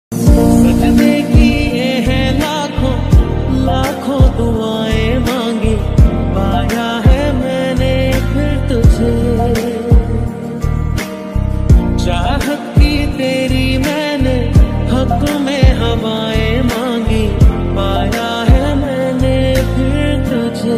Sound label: tamil song ringtone tamil love ringtone bgm love ringtone bgm